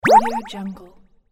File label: warp zap; floraphonic